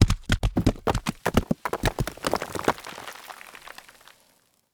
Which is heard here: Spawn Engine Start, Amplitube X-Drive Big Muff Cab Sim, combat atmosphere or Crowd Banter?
combat atmosphere